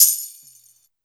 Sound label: PERC, PERCS KIT; Ragga & Dub Reggae; USB Soundscan vol.27; AKAI